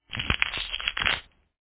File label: skinning